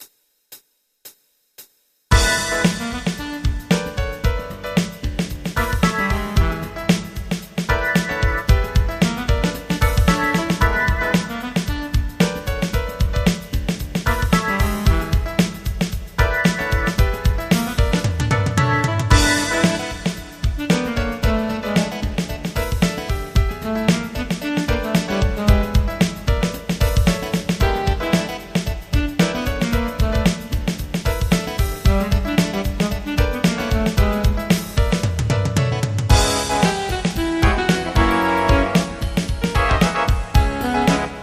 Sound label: finger snap funk